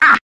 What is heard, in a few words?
"ah" cartoon, Voice; ooh ahh